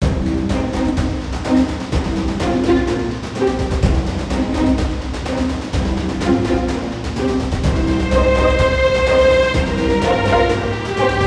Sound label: Intense